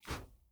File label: Wings Walk